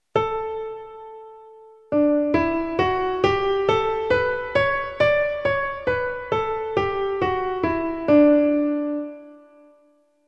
dict escala

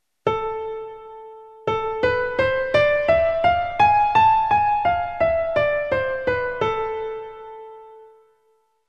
escala

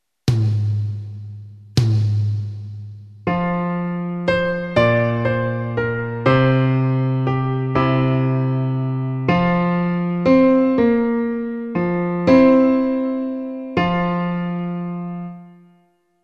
2 voces

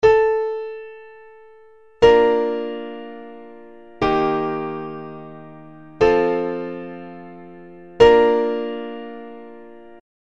cadencia

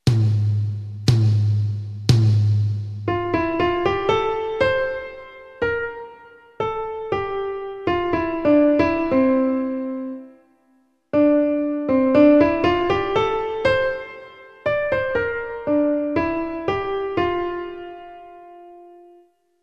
dictado; conservatorios profesionales asturias

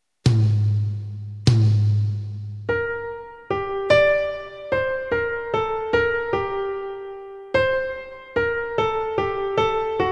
dictado orientativo; conservatorio alcal henares

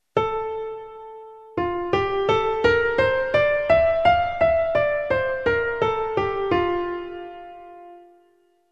escala dictado; jerez de la frontera

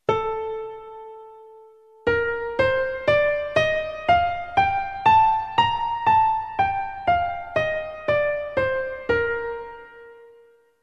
escala

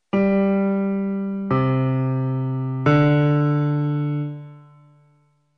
graves sol m

